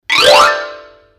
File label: jumpscare; xylosaurus